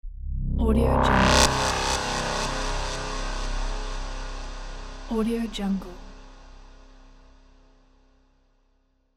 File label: bass whoosh